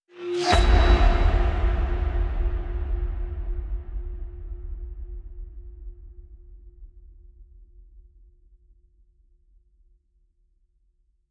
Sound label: cyber impact, fx